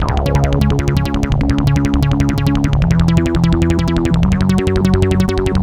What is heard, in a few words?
Bass Loop